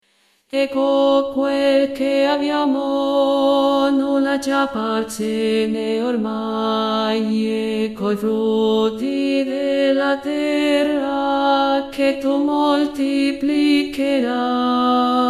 voce bassa; studio canti

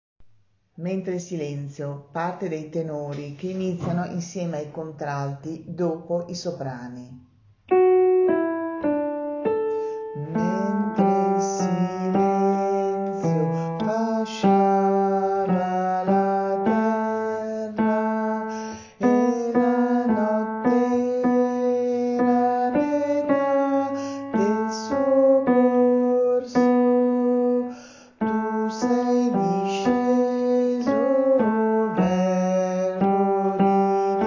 mentre il silenzio tenori; Voci; studio canti; La parrocchia

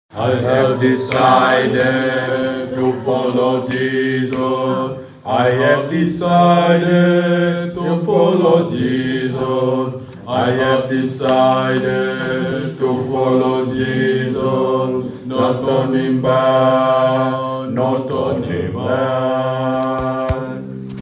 bassi; I have decided to follow Jesus; Voci; studio canti

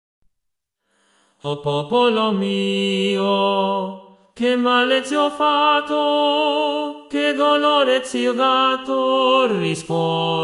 O popolo mio uomini

